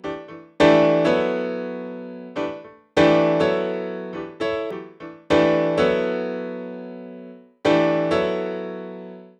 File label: 102 NuJazz Piano Emaj, Grand Piano; Digidesign; Pro Tools Essential Loops